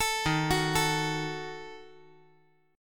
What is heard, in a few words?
Eb Diminished; arpeggiated; 14,15,14,12